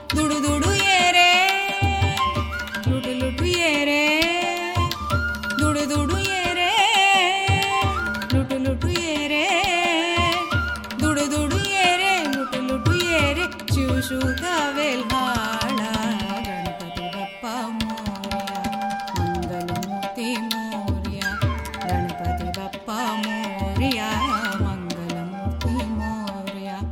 abhang; carnatic music

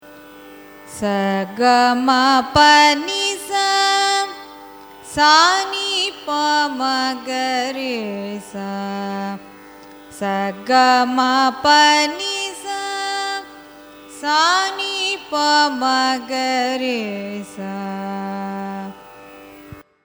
raga jaganmohini arohanam avarohanam; carnatic music

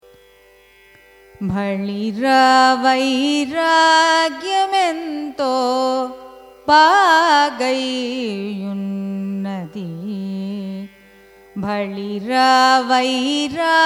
raga kalavati carnatic

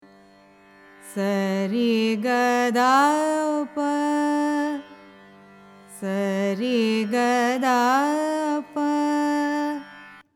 vasanthi, carnatic music

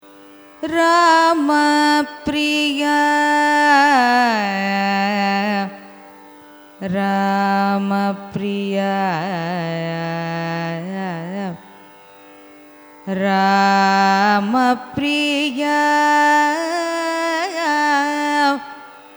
raga ramapriya signature, carnatic music

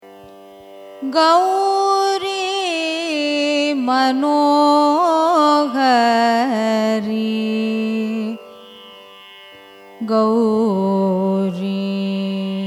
raga gowri manohari signature; carnatic music

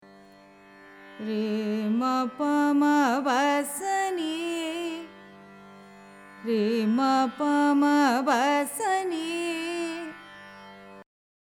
madyamavati, carnatic music

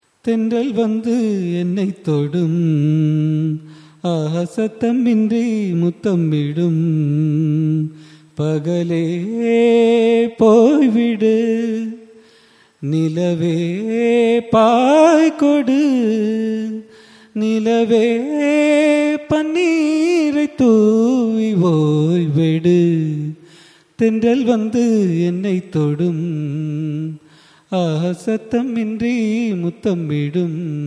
raga hamsanadam cine

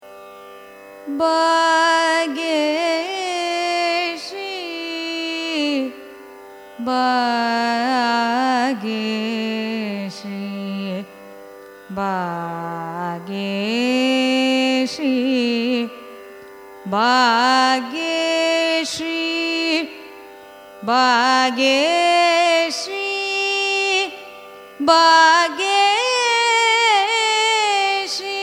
raga bageshri signature, carnatic music

